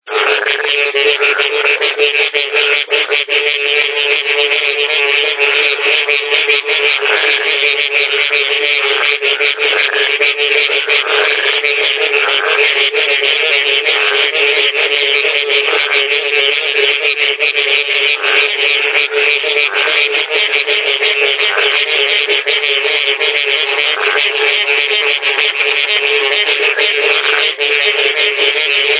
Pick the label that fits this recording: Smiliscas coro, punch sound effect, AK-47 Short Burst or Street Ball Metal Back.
Smiliscas coro